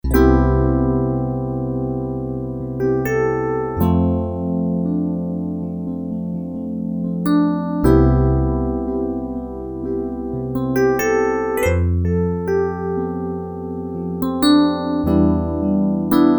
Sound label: Vintage Phaser; t.c. electronics M300